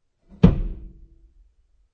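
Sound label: Car Interior Hood Popped Open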